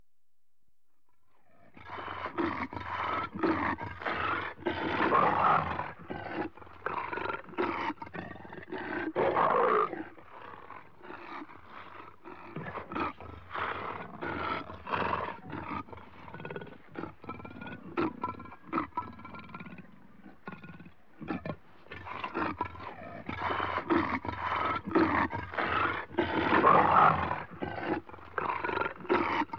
Leopards, Close Up, Growling, Africa, Natural World; BBC Sound Effects Library